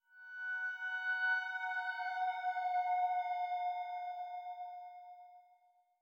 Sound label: Jingles CRYSTAL PIT MUSIC TRIGGER; Semblance